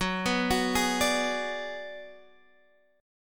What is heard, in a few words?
G sharp Minor 7th Flat 5th F sharp